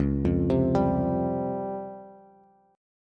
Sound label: Db Major Sharp 11th, Bass Standard; arpeggiated; JGuitar